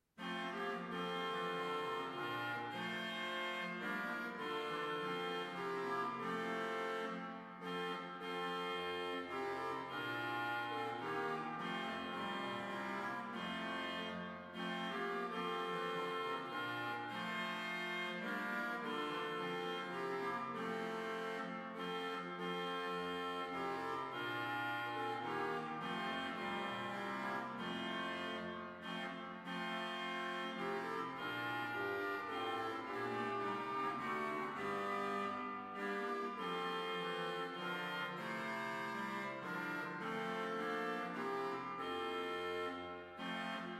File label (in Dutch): Hinsz-orgel klankvoorbeeld; Appingedam